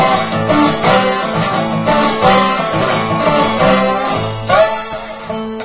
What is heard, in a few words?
dobro; music; Left 4 Dead 2; Source Engine; zombat